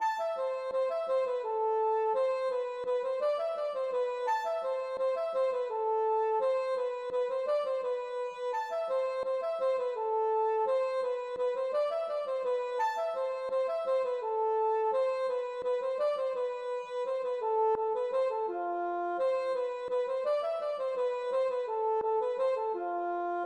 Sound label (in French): valse